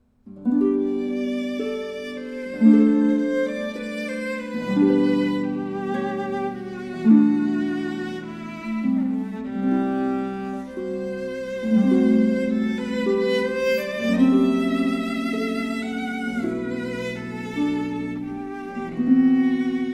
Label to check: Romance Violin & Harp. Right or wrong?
Right